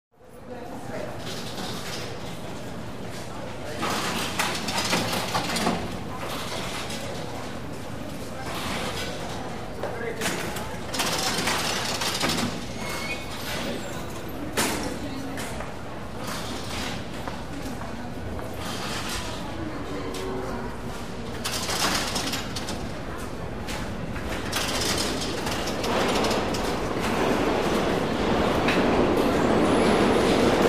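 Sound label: Close Subway Turnstile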